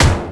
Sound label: trash can impact